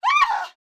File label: woman fear scream; soundscrate